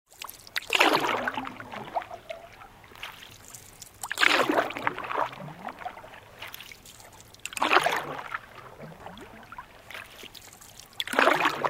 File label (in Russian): Legkaya Greblya veslami; шум реки, течение реки в лесу со звуками прир